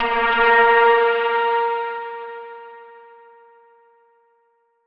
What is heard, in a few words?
chime; chime sharp